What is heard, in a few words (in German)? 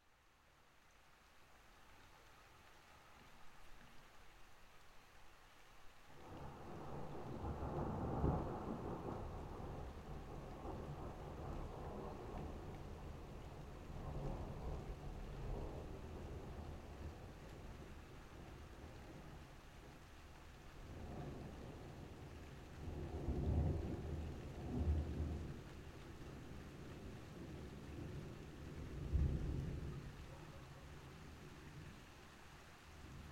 Frühling Gewitternacht; Heppenheim